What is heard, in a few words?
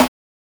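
mod snare, Synths and FX, Mod Drums; neuro; Sinistarr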